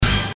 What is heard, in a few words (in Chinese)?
镜子音效; 音MAD; 蓝蓝路